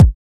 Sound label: perfect kickdrum b key, Royality free kick sound tuned to the B note